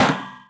chair iron hit; bouncyobjects; Tony Hawk's Project 8; XBOX

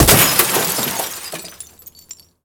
Glass Smash

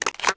Reload Pump shotgun; weapons; Max Payne

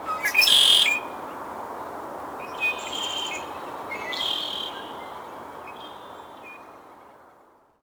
Blackbird, Ambience; Project Gotham Racing 2

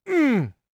throw; UFC Tapout

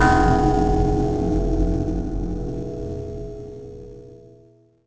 bell; The Elder Scrolls III Morrowind GOTY; XBOX

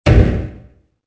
glass hit; Soldier Of Fortune II Double Helix; XBOX

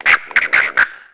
Pirahna Death; Aliens

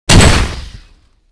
Launch

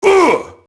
merc get hit; enemy; Max Payne

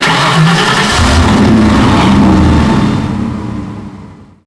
mercedes starts revs; Max Payne; tenements

